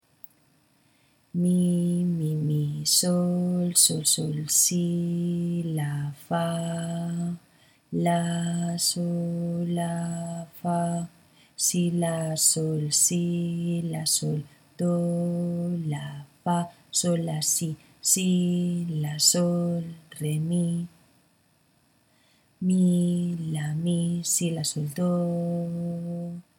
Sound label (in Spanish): ritmo 6-4; Equivalencias